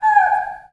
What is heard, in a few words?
BIRDCALL, Heart of Africa; Spectrasonics; BIRDMEN